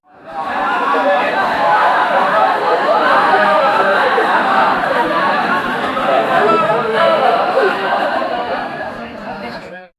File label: background club music